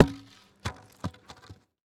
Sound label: Impact Wood Drop